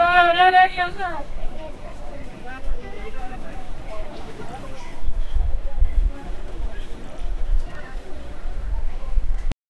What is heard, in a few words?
market square in charlest